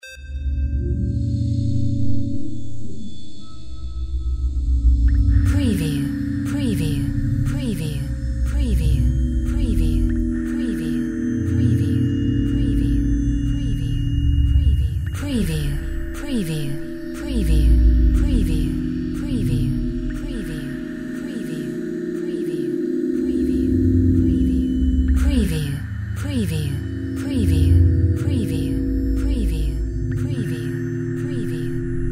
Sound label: drone river of tones drip fx; windy beach audio